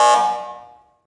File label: Basketball